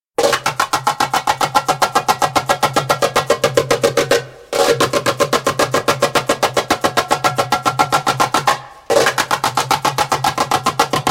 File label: Alternating Shifts (fast) spoken, Djembolale, Fills and Drills